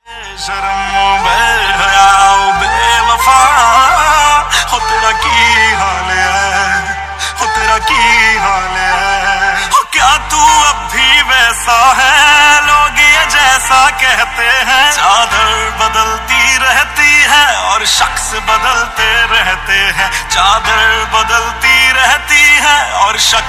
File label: Bgm Hindi Ringtones